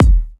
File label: punchy kickdrum sample e key; kick drum samples